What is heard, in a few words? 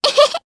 Vox Laugh, jp; voices heroes jp; Annette; kingsraid